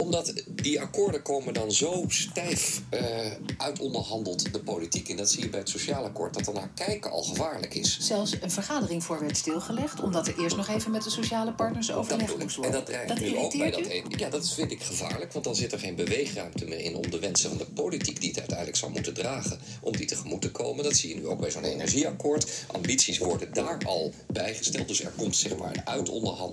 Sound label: techno; techno en politiek gaan best goed samen